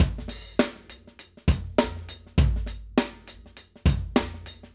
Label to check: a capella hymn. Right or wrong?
Wrong